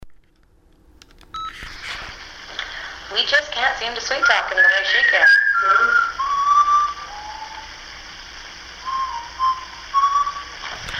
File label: whistle